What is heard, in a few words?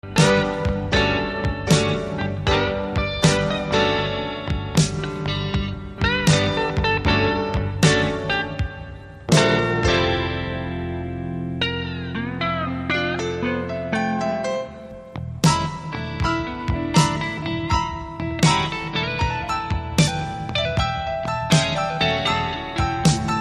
Steely Blues